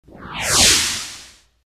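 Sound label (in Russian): Вжух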